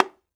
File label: Percussion, House